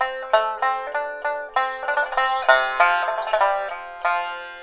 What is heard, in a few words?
koto sounds; WORLD